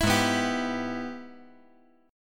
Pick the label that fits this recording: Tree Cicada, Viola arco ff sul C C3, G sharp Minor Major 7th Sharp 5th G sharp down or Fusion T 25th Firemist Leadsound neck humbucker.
G sharp Minor Major 7th Sharp 5th G sharp down